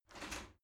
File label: shower slide door slide short open